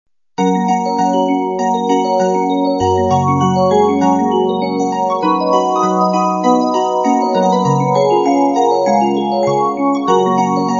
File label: Jingle